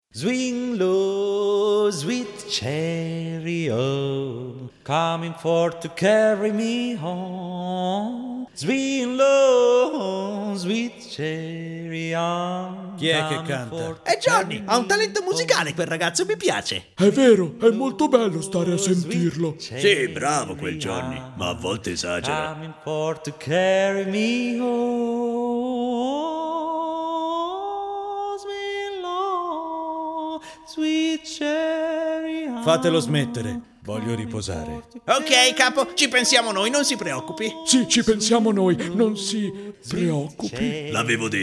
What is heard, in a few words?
cartoon song